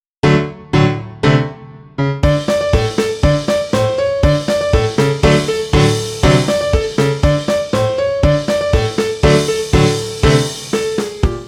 piano drum kit

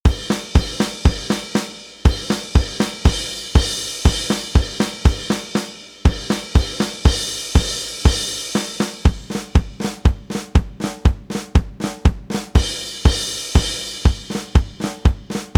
drum kit

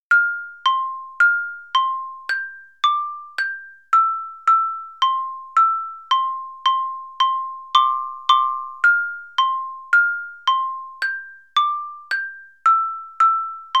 glorious return xylophone

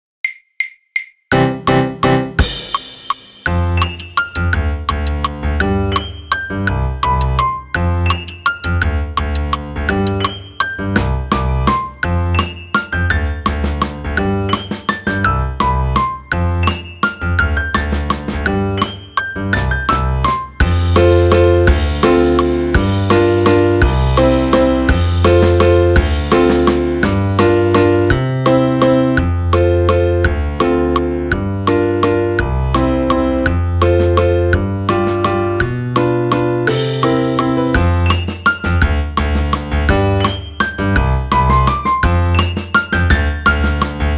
viennese waltz piano drum kit click

